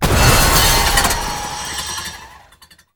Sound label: Shredder Death Underlay